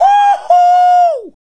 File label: Woo Hoo, Vocal Toolbox, Sound Bites; Sonic Foundry (Sony Creative Software)